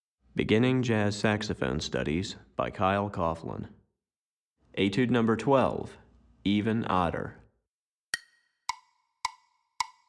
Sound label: Piano Part, Beginning Jazz Saxophone Studies Alto